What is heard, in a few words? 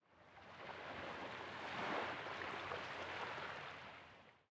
wave